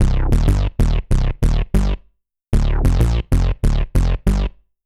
Matrix Bass 95 C; musicradar; bass boutique; 95bpm

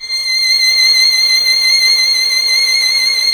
violin, Solo Instruments; Miroslav Vitous - Solo Instruments VOL-2

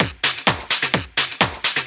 Clubhouse Loop 128 BPM

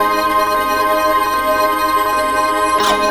Pad

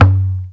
CONGA L; East West - Global Sounds; AFR.BRAZ

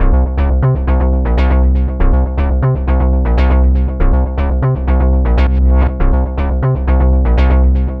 Bass Line; Eclectic Electronica

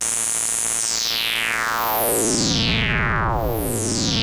Moog A2; Hollywood Edge; Giorgio Moroder Rare Synthesizer Collection; MLTI.MOOG

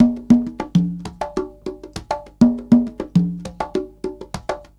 Percussion, R&B Drums

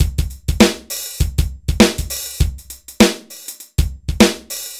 dry loop, BPM100

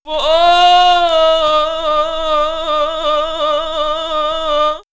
Ethno Vox Islamic Prayer; vox mix; X-Static Goldmine

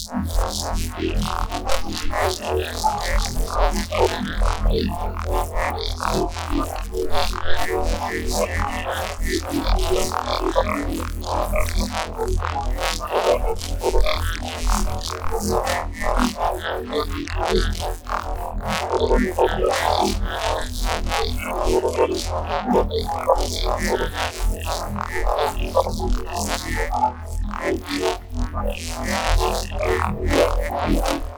Bass Granulize E

